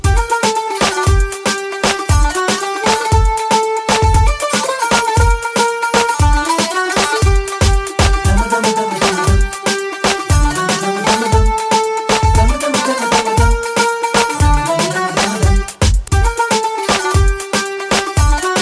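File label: background music, Telugu Ringtone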